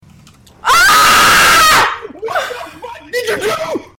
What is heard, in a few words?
scream